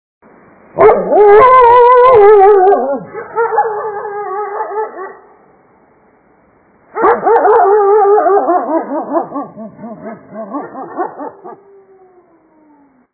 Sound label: bark howl, wolves